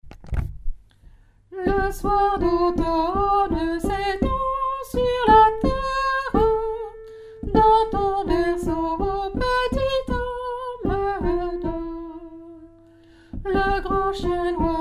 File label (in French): berceuse basque mezzo